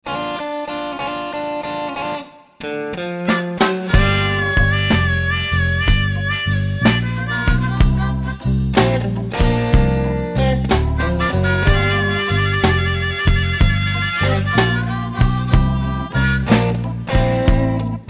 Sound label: Harmonica Blues